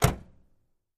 Camaro Door Close, chevy camaro

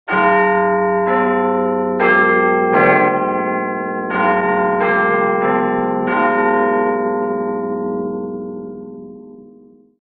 Bells Big Ben, bell towers

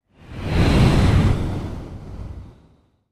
Air Whoosh Air Whoosh, fireballs

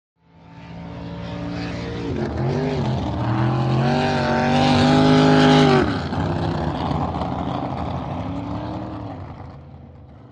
Drag Boat By Cu Boat

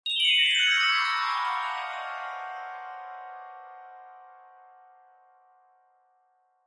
Orchestra Bells Magi, mallets bell

